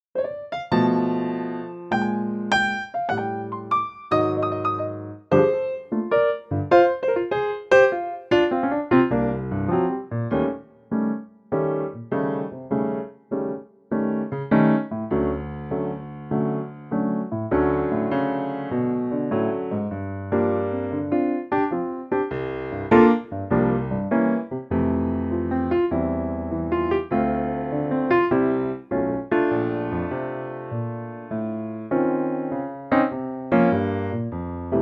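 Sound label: piano track F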